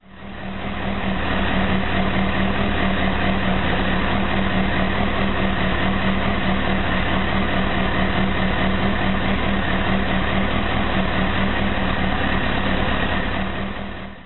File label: after, bike; zx-10r